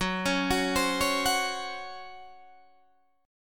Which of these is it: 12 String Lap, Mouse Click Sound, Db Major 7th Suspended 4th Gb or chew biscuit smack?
Db Major 7th Suspended 4th Gb